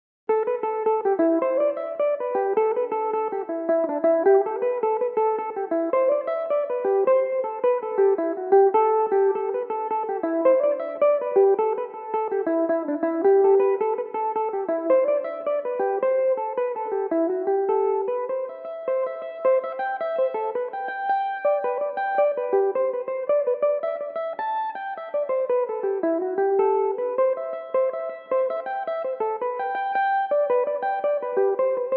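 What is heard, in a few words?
A dorian, Irish mandolin; The Piper's Picnic; Belmuse EM-190 electric mandolin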